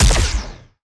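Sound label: Laser gun Fire; Lasrgun Hyperion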